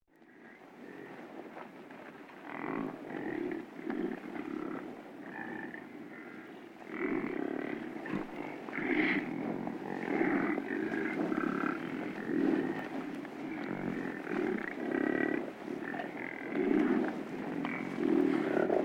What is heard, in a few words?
Buffalos